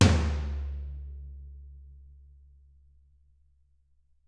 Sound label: TT, Toms; Bob Clearmountain Drums I